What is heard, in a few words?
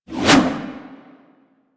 spear throw fire; Warframe; Weapons; Corpus; Spear Gun